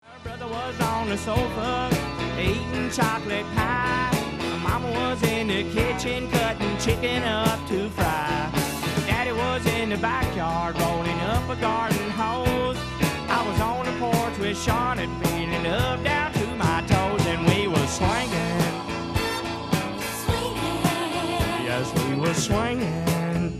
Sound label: swingin